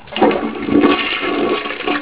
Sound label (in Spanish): vaciar papelera; DUKE